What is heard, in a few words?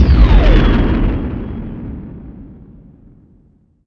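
combat explode z95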